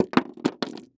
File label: Impact Object Fall On Wood Floor Slow Short